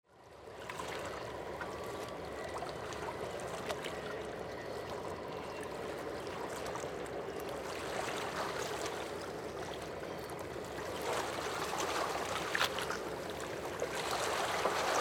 waves

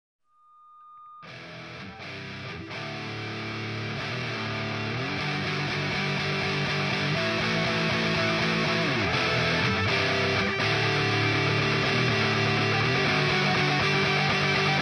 Army-Navy Action; Soundzabound Volume 5 Disc 2

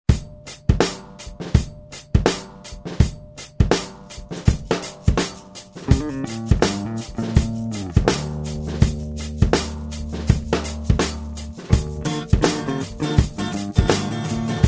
Alley Jam, no vocals; Soundzabound Volume 5

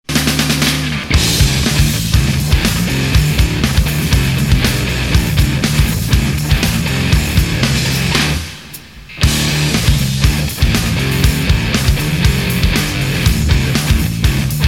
Slam Jam; Soundzabound Volume 4 Disc 1

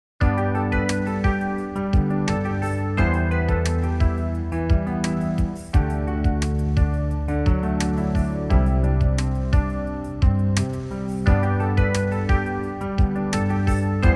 Perfect Loop; Soundzabound Volume 1

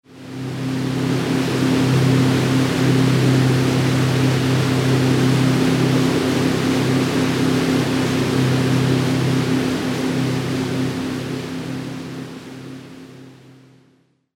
Boat Ride Ambient

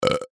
Burp

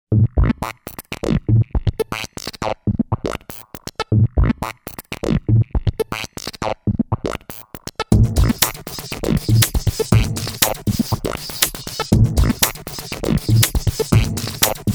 Electronica Undercurrent; Soundzabound Volume 4

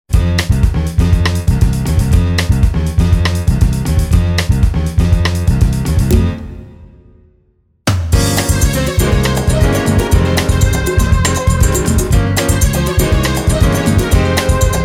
Latin Jazz; Soundzabound Volume 4

